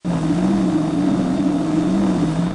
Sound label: balloon fly loop